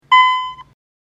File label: beep; esphome-vistaECP; esp8266